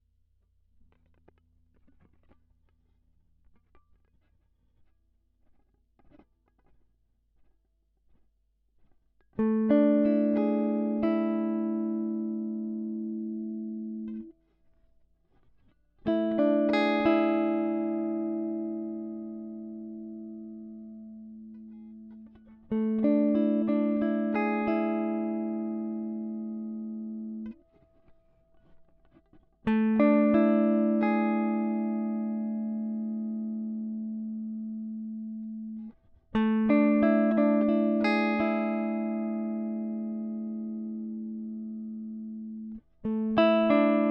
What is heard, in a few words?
E90 Gtr Arps